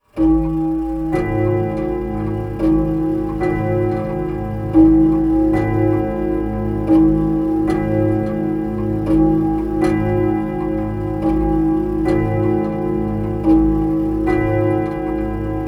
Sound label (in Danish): Bornholmerur; musikquiz